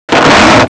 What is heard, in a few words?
loud velcro; Sound Effects for Multimedia - Volume 1